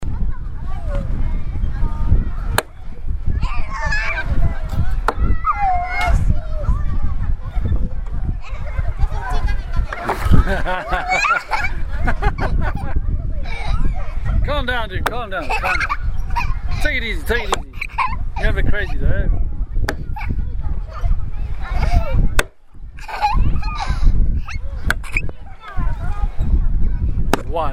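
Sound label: dad with child playing on swings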